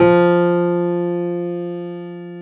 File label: piano F2; AKAI S-Series CD-ROM Sound Library VOL-8